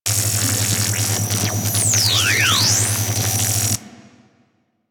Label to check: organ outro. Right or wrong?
Wrong